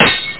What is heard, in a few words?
Sword; eldritch